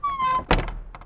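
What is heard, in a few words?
d gate close; Mafie 1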